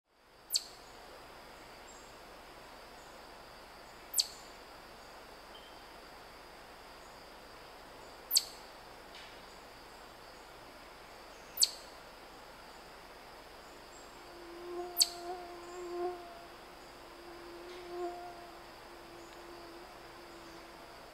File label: Tamias, سمورچه